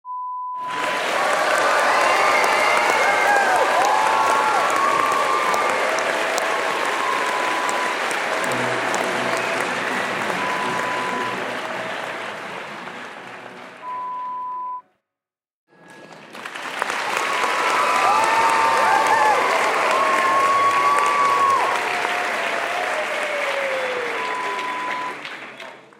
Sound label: Theatre audience sounds